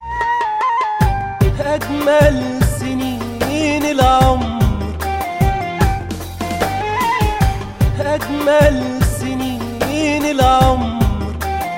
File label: Mmmmmmmmmmm